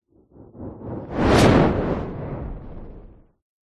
science fiction ufo spacecraft small spinning fly past